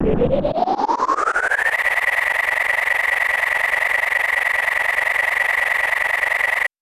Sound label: Charging Beam; Samus; Super Smash Bros